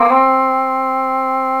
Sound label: Shanai; Ethnic; GMTones